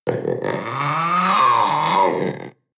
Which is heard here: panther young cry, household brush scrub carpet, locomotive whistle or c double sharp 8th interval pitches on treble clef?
panther young cry